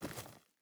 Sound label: footstep burlap forest walk; Rust